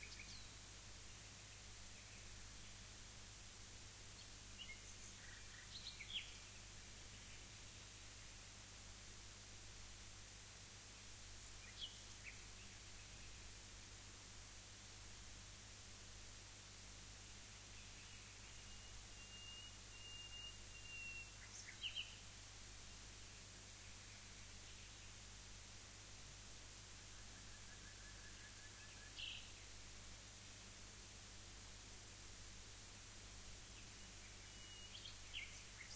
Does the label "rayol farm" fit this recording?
Yes